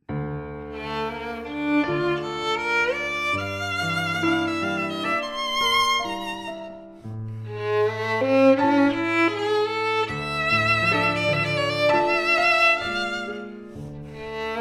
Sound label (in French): violon et piano; IUAV; musique instrumentale